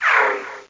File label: Roll